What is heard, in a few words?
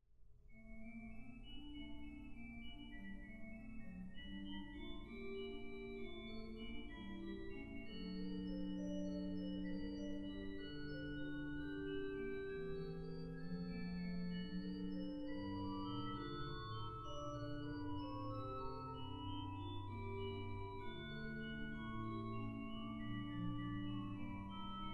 Organ Registration, Prelude in g minor, tierce section; Buxtehude